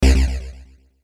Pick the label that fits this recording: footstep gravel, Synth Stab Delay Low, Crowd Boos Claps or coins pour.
Synth Stab Delay Low